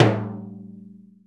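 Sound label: Timbales Xswitch, center stroke; E-MU Producer Series Vol. 2 – More Studio Essentials; Composer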